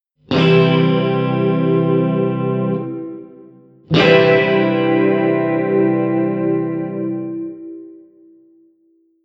Acorde C + Harmonicos E B G D

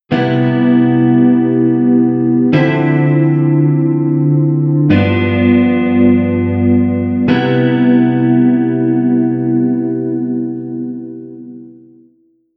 Cadencia C7M Dm79 G7 C7M

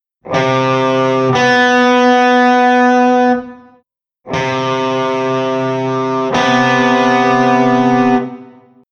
Intervalo de Setima Maior

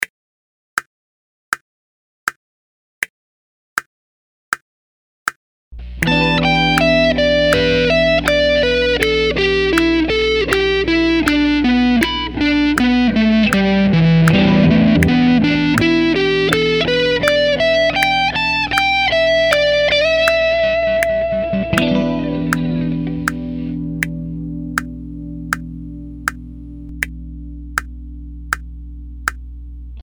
metronomo; em7

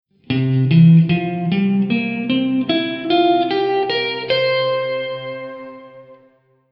Escala Pentatonica de C7

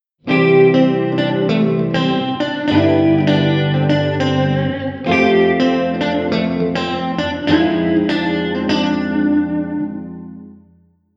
Cadencia C G F Fm

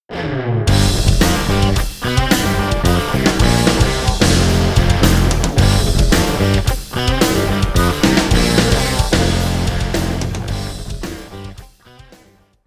Riff com Ring Modulator

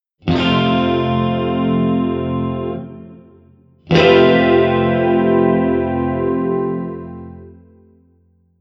Acorde F + Harmonicos E B G

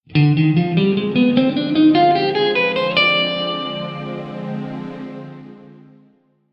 Escala de D Locrio 6M

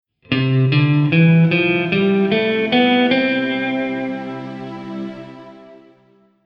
Escala de Do Maior Diatonica

